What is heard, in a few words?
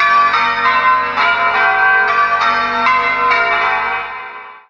church; schattenlander